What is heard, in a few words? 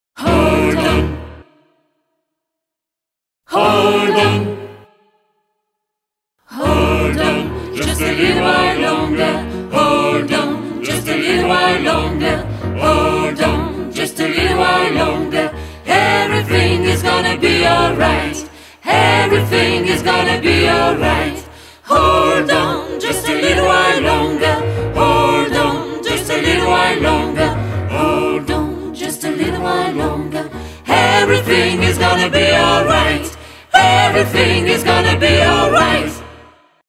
SWING GOSPEL Hold on